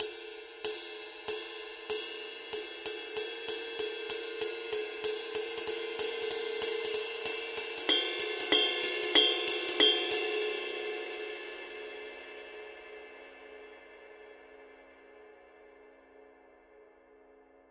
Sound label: PAISTE TWENTY MASTER CRISP ROSIE RIDE